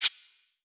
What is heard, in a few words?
slide close short